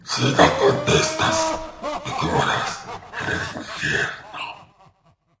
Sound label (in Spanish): risa malvada; tono de llamada